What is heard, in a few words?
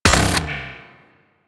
Shot; Cycron; Charge Gun